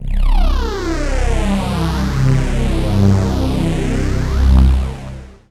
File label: Synths C; Vengeance Samples